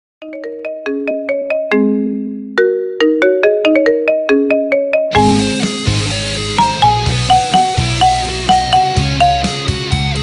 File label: marimba remix ringtone